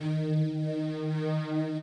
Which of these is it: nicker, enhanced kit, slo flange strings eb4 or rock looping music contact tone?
slo flange strings eb4